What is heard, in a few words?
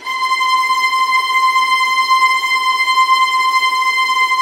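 VIOLINT D6, Synclavier Strings; ILIO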